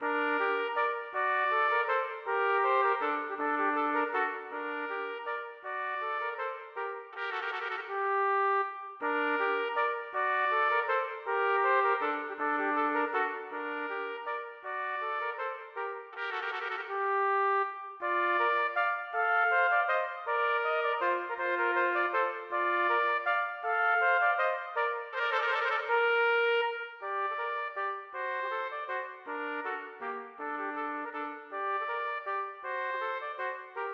Menuet for trompetduett; Aamodt

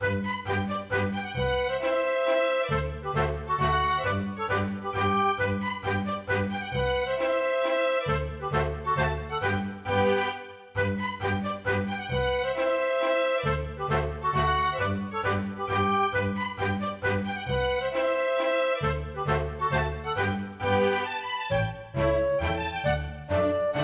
Polka Masurka; storgårdsmusikk

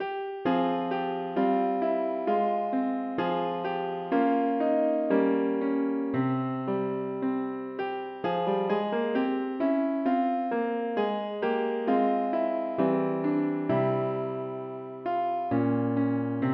piano

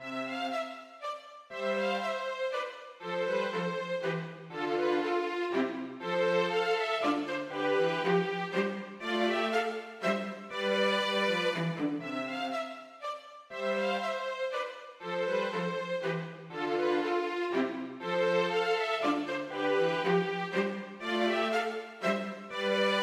Menuet for to fioliner og cello; Aamodt

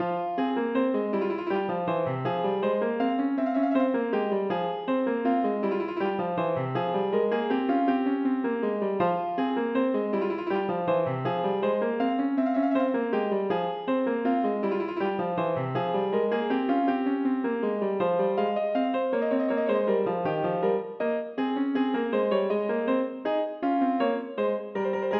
Menuet for piano; Aamodt

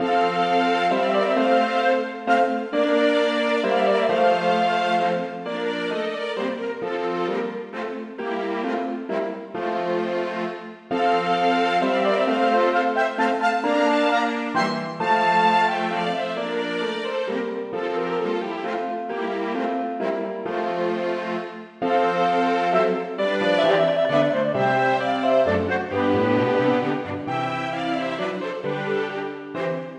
Menuet for klarinett og strykekvartett, med piano; ad lib piano

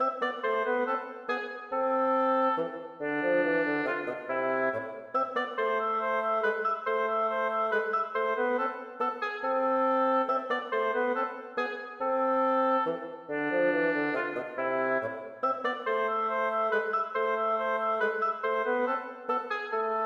Menue for obo og fagott; Bang

